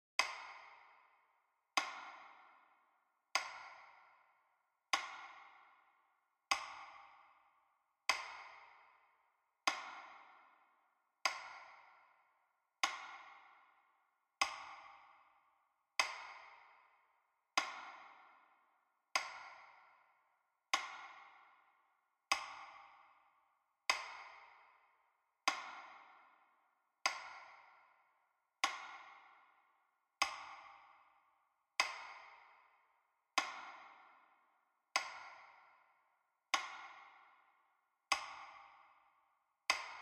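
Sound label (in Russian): метроном с долгим периодом на минуту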